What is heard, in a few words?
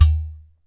udu hit, Udu Clay Hand Drum; ETHNIC and WORLD PERCUSSION